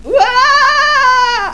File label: WAAAA; Carmageddon